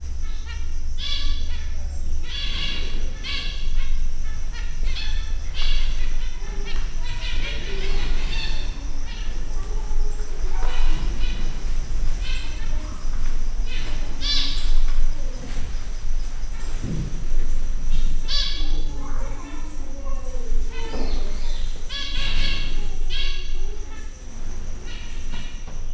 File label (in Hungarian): elrepülő madár huzatos felvétel, zebrapinty; Győri Zoo 2014